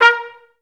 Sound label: TR STAC A#3; Best Service; Peter Siedlaczek's Advanced Orchestra